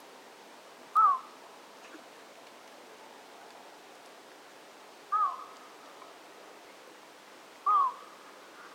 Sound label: Eurasian Bittern - flight call, Rohrdommel